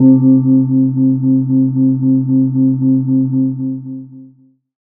Ethnic Synths, Dance Synths; Best Service ProSamples vol.37

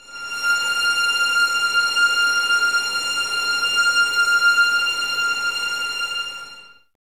VS F 6, String Ensembles, 11 Violins, Sul Ponte; Miroslav Vitous

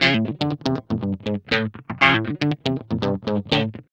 GUI, LICKS; 120 LICKS

